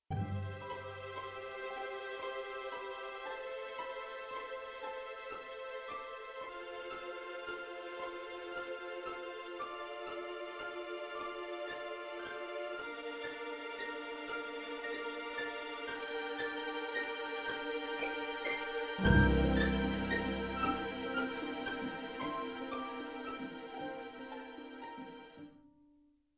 melody ww summary backing dry

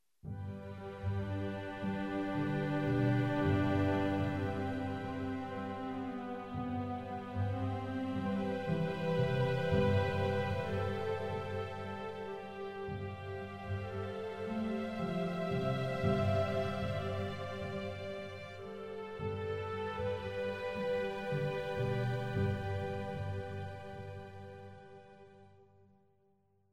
melody groups violas winds Violas Clar

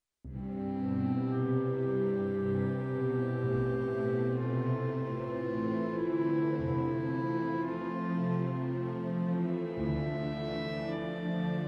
melody ww summary clarinet

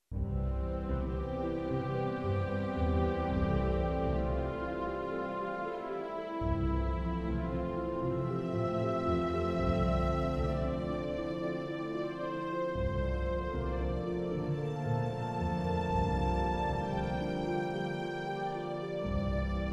melody groups violins winds violins Oboe

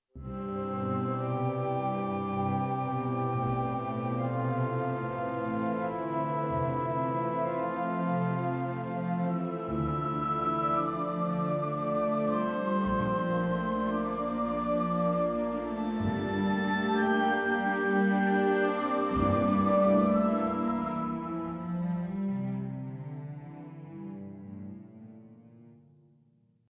melody ww summary 2 octave

